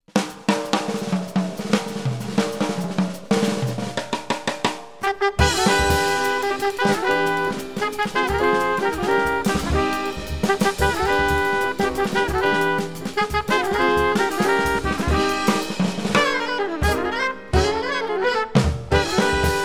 minus bass

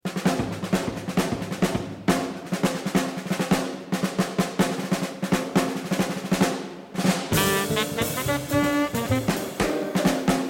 melody drums

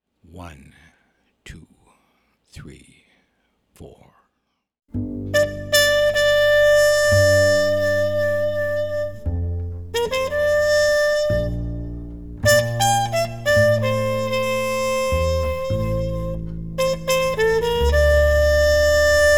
minus Piano

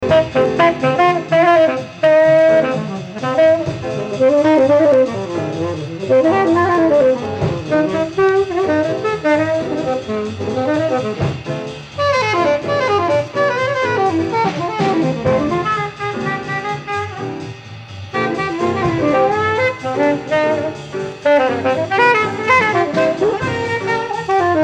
fours to end, saxes solo

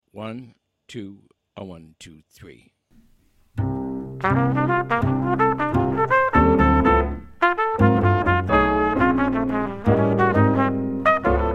SLOW minus drums

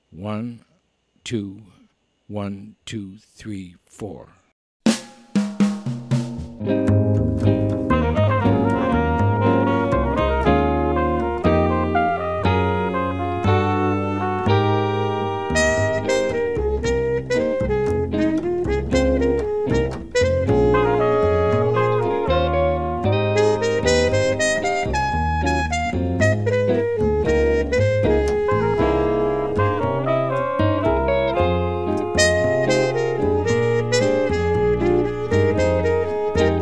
minus drums

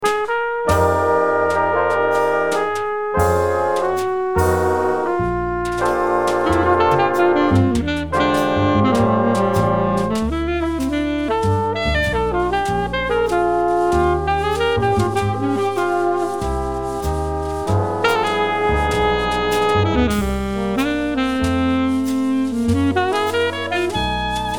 solo

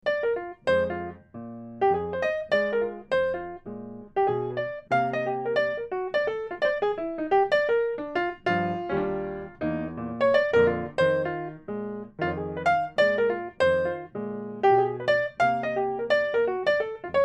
playing

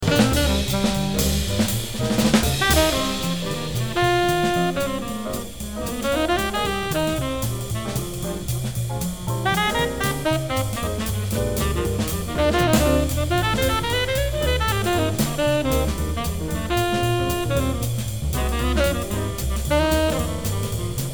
Tenor Sax Solo, medium up swing

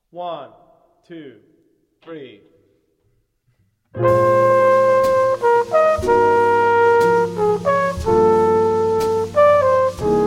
minus bass

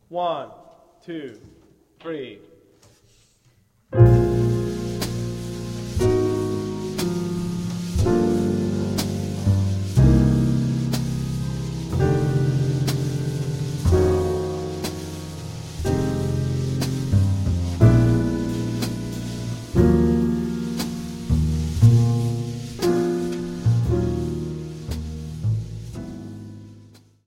minus melody

